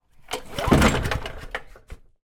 household lawn mower petrol starter cord pull fail